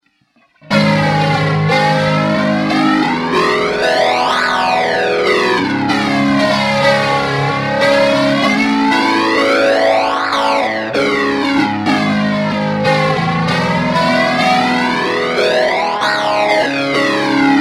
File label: Flange